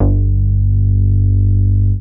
G2, BASS; Q Up Arts; Dream Experience; BASS BANK 13

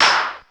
Perc Noize, Ensoniq ASR-X Pro

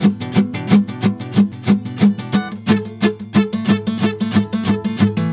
GUITAR, Balkanish Traditions

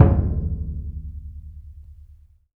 AFRO DUNDUN, LRG DRUMS

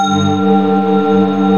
HARMONY, ENSONIQ VFX; Rubber Chicken Software Sample Bank

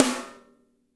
SNR; Spectrasonics; Burning Grooves

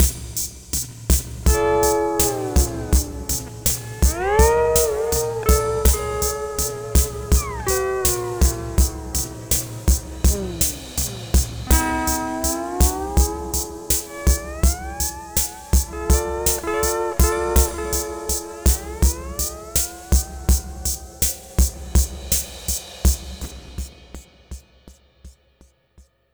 Sunrise Full-G#, Construction Kitz Lounge; Zero-G Kocktail Kollection; Sunrise Mixes 082

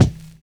BUZZ SNAP; Univers Sons - Kitch 70s

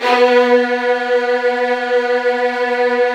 Virtuoso Strings

